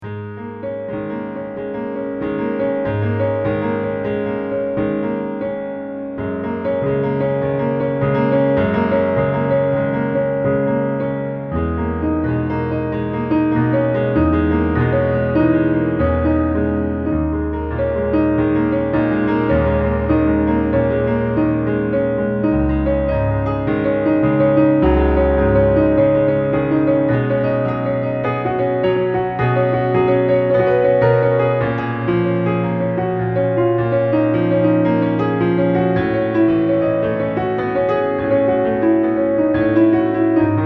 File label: Piano; music samples for mary katzke lymphodema film